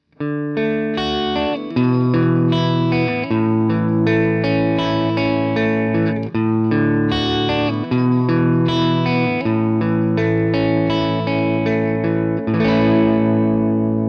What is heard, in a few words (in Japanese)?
アルペジオ